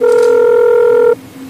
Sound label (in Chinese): 电话音 Raw Accordion; 特朗普